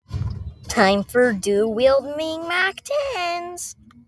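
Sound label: ahhhhhhhhhhhhhhhhhhhhhhhhhhho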